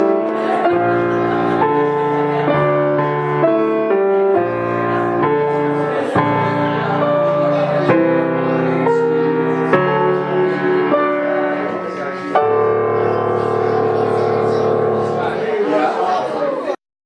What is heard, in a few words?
duet, piano